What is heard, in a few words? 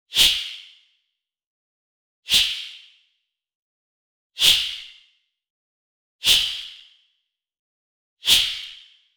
bramble whip snap